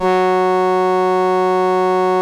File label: LAMBDA, String Machines; Best Service; XX Large Most Wanted 2